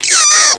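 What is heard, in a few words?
RABBIT DIE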